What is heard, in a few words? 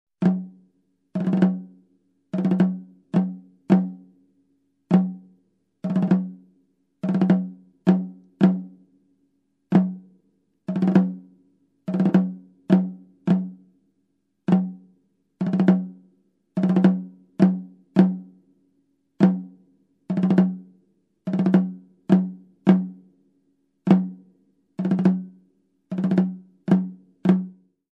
African drum military groove